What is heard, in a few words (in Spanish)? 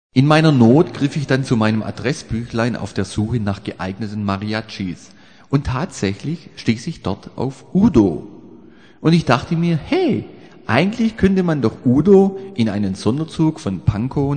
Mariachis